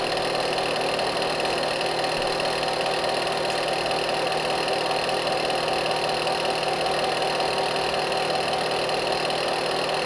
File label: Turbine